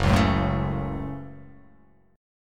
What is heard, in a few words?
F sharp Minor F sharp, Chord